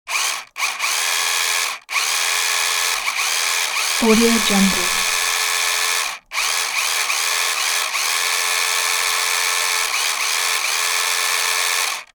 toy car motor running; sound-ideas

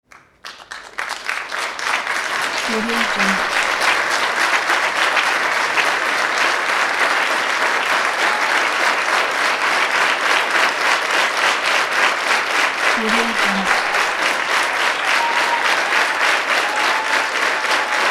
large crowd applause cheers

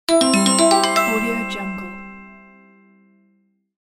game music success

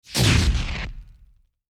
small sci fi blast explosion

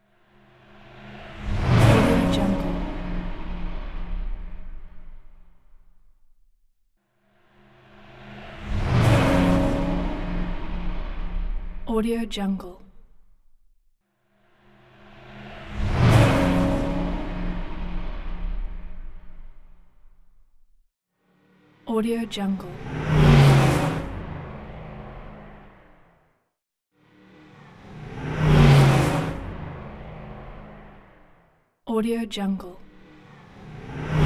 space vehicle pass bys fast humming whirring

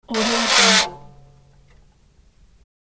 atv adjusting engine; sound-ideas

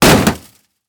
metal hit

